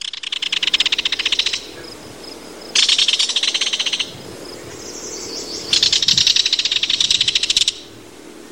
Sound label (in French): martin pecheur